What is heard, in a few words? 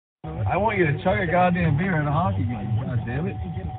Dad chug beer